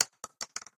Shell Shotgun Bounce

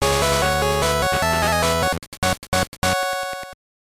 Jingle; Jungle no Ouja Tar-chan

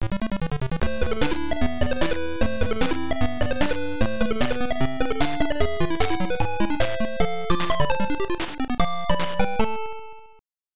Area Clear; hydefos hyper defending force system msx2 psg

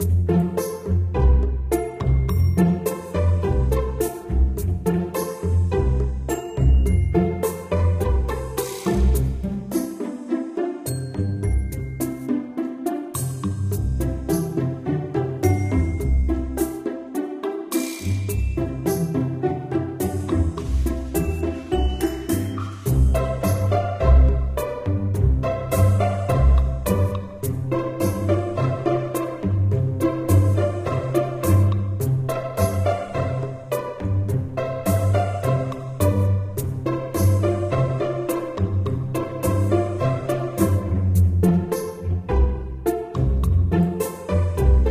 Music Gameplay; Bubble Cats Rescue

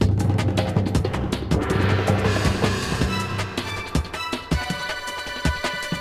Descend

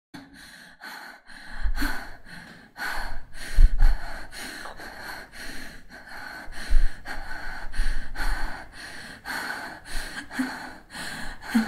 Breathing; fractured minds

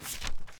Panel Record File Place; Among Us

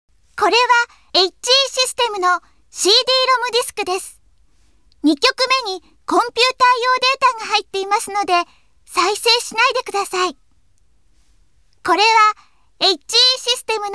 Warning; Spriggan Mark 2 Re-Terraform Project; pc engine cd

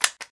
Panel Gun Set Handgun; Among Us

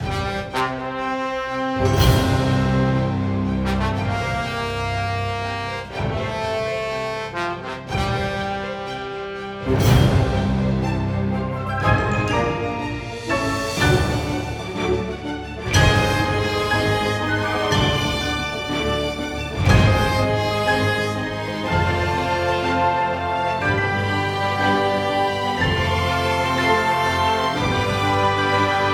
Cinematic Music; Spirit Of Adventure

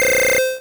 Aurora Beam; sfx; attack moves; Pokemon; gen 1; rby

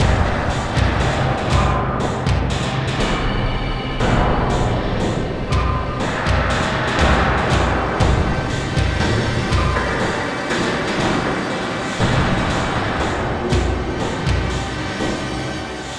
Danger Tonal; Insanely Twisted Shadow Planet

